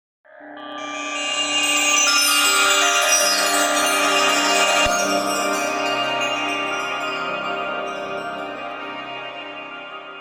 sonido brillo estrellas; sonidos magicos

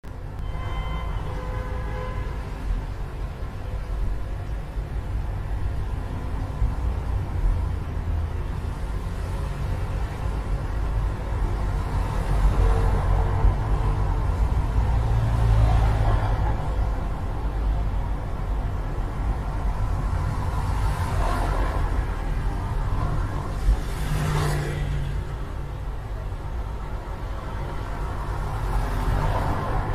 sonido ambiente ciudad; ambientes

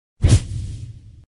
Sonido destello; sonidos magicos

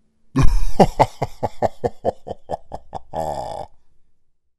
Sonido Risa malevola; sonidos de terror

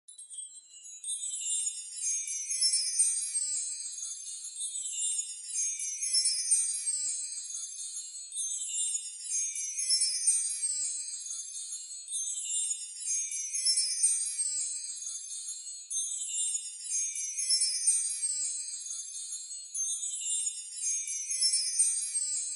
Sonido brillo de la Navidad; navideno

